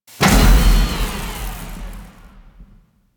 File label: Sabotage Panel Deactivate; Warframe; Guild